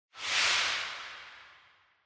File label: Camera Motion, High Reverb; カメラモーション04-6(高 リバーブ); シーン・カメラモーション